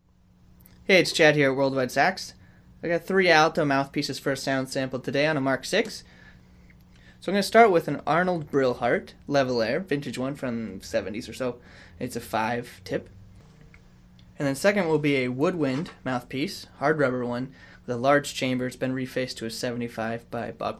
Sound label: Alto Woodwind D7; MPCS Brilhart LA5